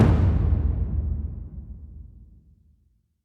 Rand Drum; Hubs; Syndicate Arbiters

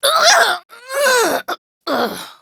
Death; Warframe; Sprag; Battle Barks; en

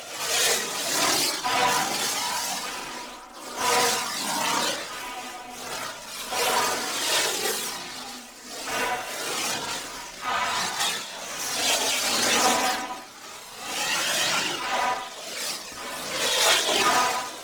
Rush Loop; Warframe; Runner

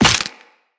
Run Bone, Footsteps; Characters; Operator

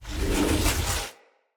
Casting Device Charge Heavy Start

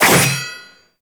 Sword Whoosh; Warframe; Teshin; Weapons

